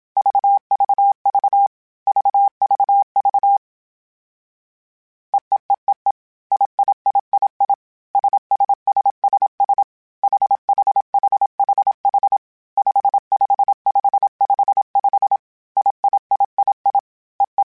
All Groups, Morse Code; HamRadio; CW Code Groups; Basic Sets

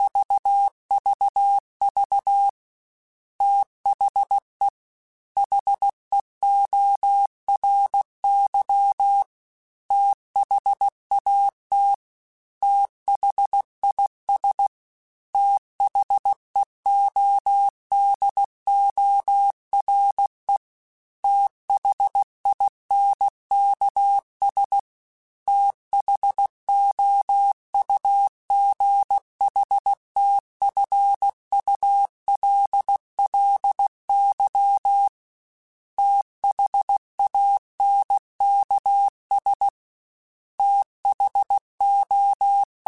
TH Runs, Morse Code; CW Code Groups; Mixed; THs; HamRadio; Prefixes

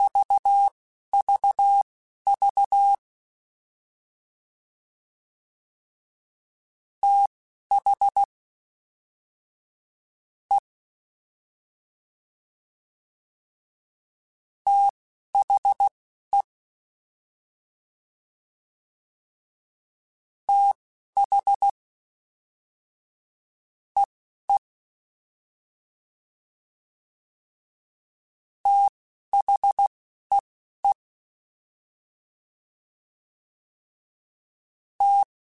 Fast TH, CW Code Groups; Mixed; Prefixes

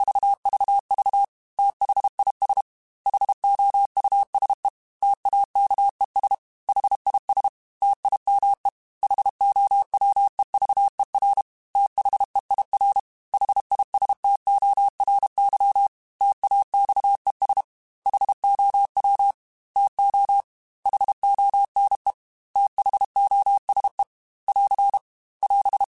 T then H Runs, Morse Code; CW Code Groups; Mixed; Single T or H; HamRadio; Prefixes

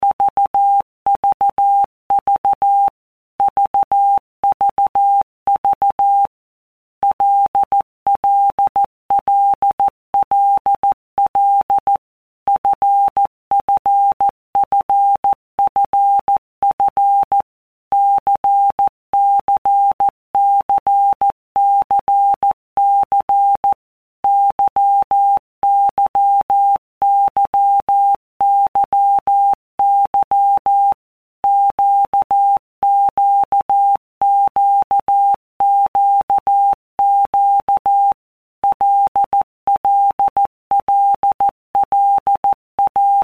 LFCYQ, Morse Code; CW Code Groups; Basic Letter Groups; HamRadio; Basic Sets

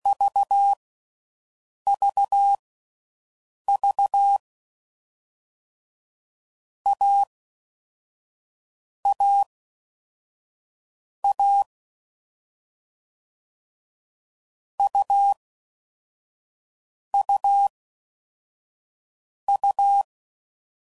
CW Code Groups 10WPM, Difficult Combos; Morse Code; Mixed; Combinations

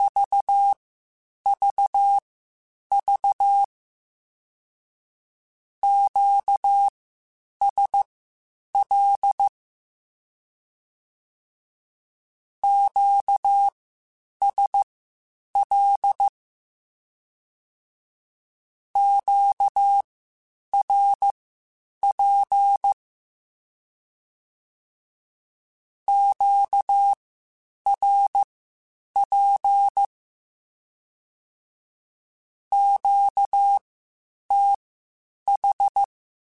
Q Calls Twice spaced, Morse Code; CW Code Groups; HamRadio

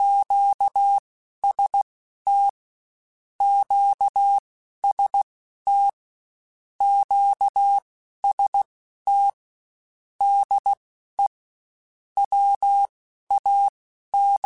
QST QST, Morse Code; Mixed; HamRadio

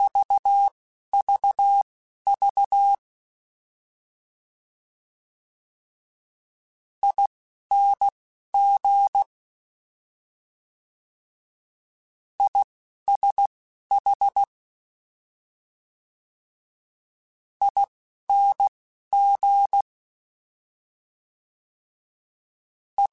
Morse Code, CW Code Groups; HamRadio; Mixed

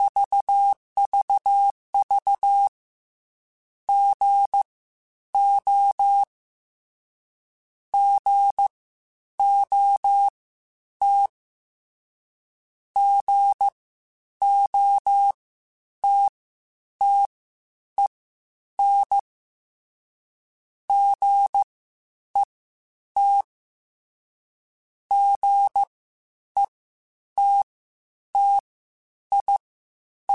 Morse Code, CW Code Groups; Mixed

